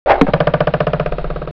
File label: arrow hit